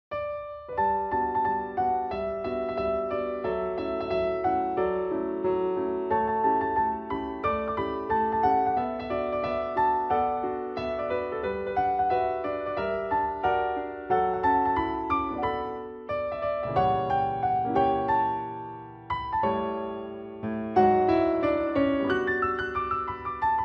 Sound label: piano ver.